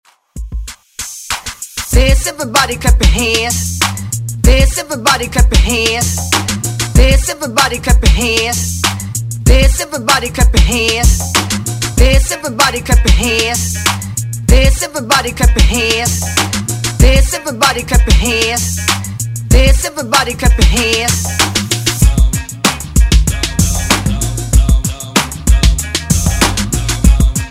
Oldschool

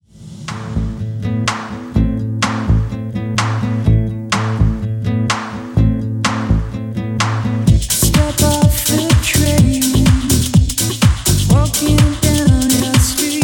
Dance Re Drum 125BPM; remixplanet

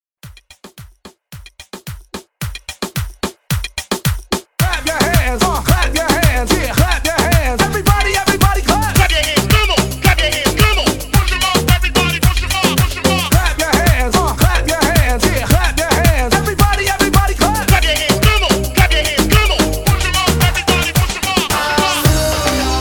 LEADER MIX 110 BPM

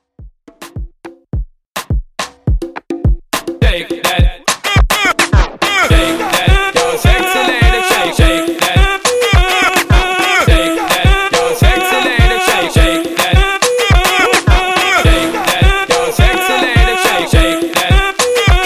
Party Breaks Afrobeat 105Bpm

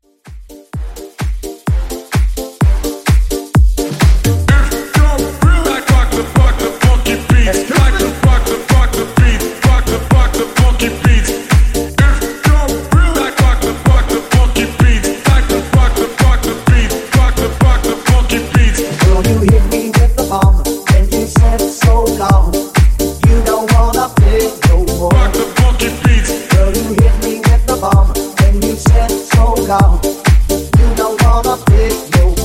Hype Redrum 128Bpm Clean

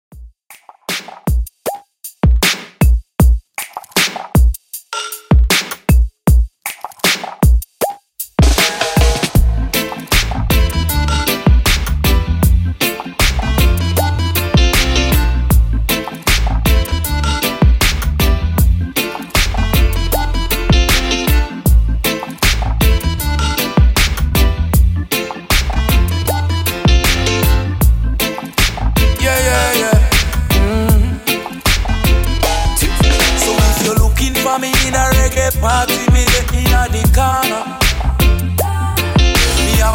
Reggae Redrum 156Bpm; djyan

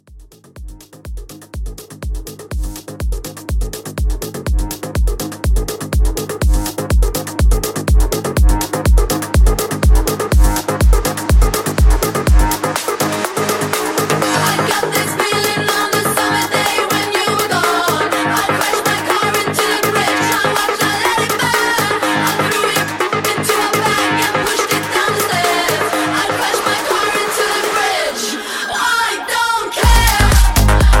House Mashup

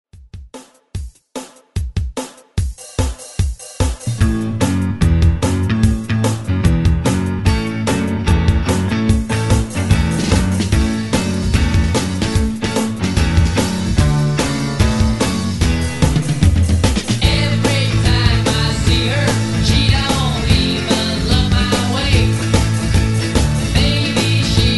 60s ReDrum 148Bpm; DJDOLLS

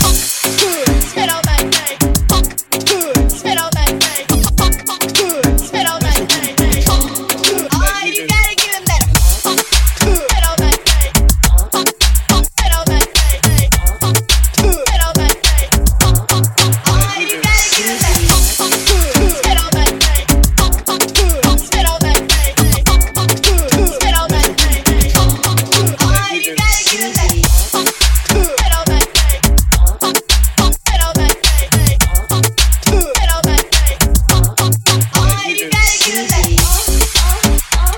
105bpm A

